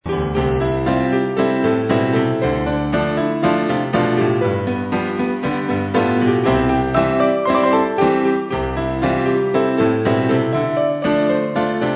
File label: Aeolian Piano Roll